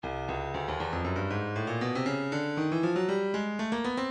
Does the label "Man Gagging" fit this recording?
No